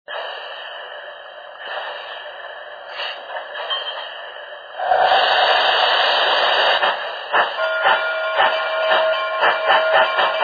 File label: shay, loksound